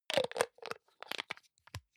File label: hygiene small screw cup close medium, badezimmer